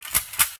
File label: reload gun; Jungle-War